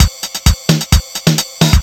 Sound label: RZ-1 + LOOPS